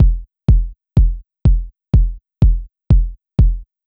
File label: Kick